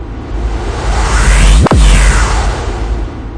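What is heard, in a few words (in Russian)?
свист маневрирующей в космосе летающей тарелки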